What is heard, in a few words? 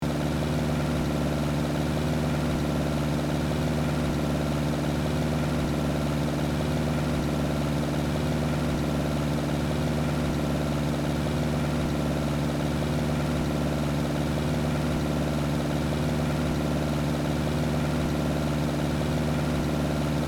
Aircraft in flight engine loopable